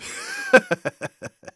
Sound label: laugh short; Engineer